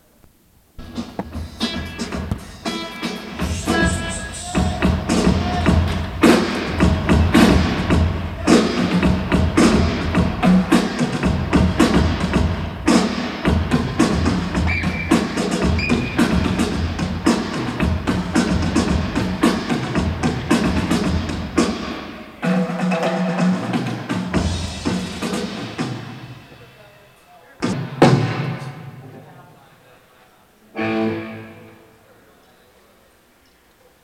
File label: Soundcheck - (drums)